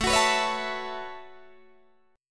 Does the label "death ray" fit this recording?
No